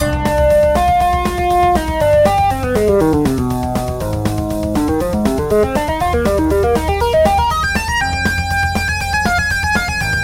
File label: LEAD; Phasewaver; Cerebral Labyrinth